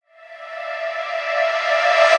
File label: vocal intensity E; SD AURORA Sample Pack; Slate